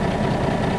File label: Drill Engine Loop; Playable Tutorial Level; TR1